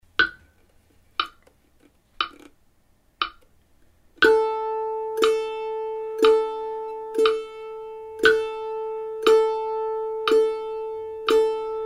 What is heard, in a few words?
rhythm; eighth note triplets